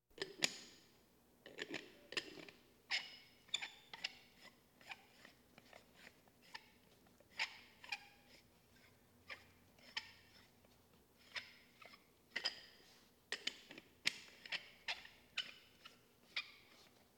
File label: Police, Fire engines